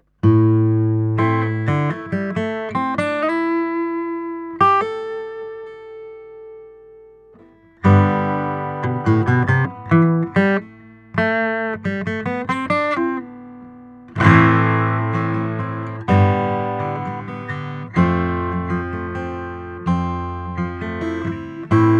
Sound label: martin ooo 01, guitar